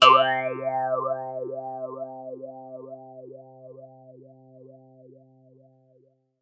jaw harp one note; World Music

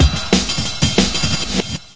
184 south street crunch, drum loops and breaks

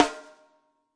real snare, REAL LIVE KITS; A Real Freakin Drum Kit

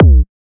Kick G, Melbourne Bounce; STYLE KITS (Hip Hop, Etc); D3ron Melbourne Bounce Kit

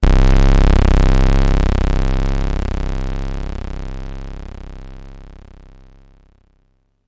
destroy you bass C1, bass; must destroy you bass 2

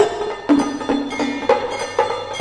100 japanese clockers, drum loops and breaks; 091 to 100 bpm

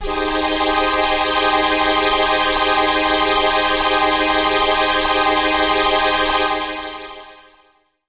classic 7th, pads

